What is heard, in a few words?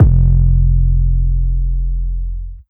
sample 808F